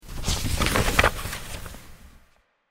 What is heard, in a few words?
page